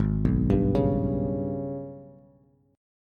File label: Ab Minor Major 11th Ab, Bass Bass Standard; arpeggiated; JGuitar